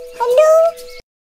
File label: angry chick